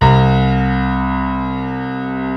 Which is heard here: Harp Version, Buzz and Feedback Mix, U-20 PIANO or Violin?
U-20 PIANO